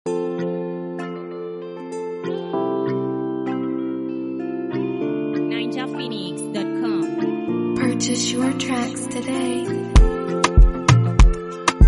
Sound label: Free Beat Type Beat